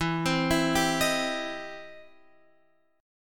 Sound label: E Minor Major 7th Sharp 5th, E, down, Guitar, Open F; EmM7#5 arpeggiated